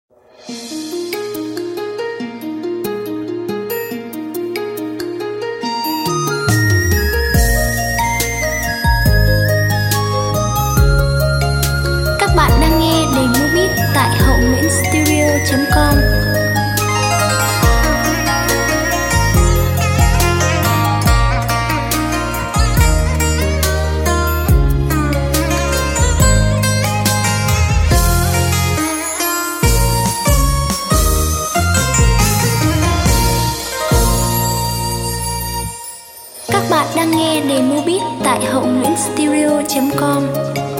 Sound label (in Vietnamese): beat